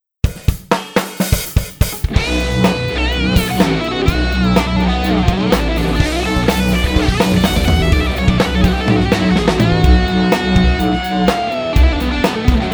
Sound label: dubstep jam